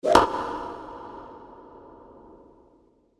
tennis ball with swosh ambient

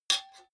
sword sabre guard parry soft short

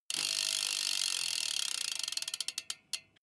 bmx bike freewheel push and slow down stop

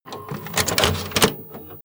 video player handling cassette

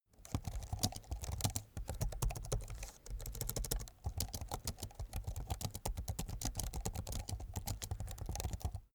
keyboard laptop type sequence fast soft

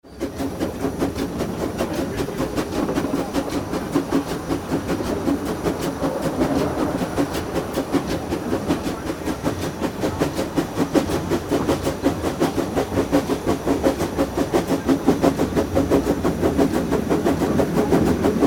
old train cabin inside

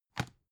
dvd case put down soft

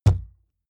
wood impact hollow large

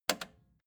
record player repeat jump out click fast

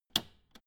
old button hard press

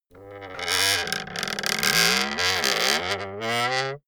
wood creak slow polyphonic

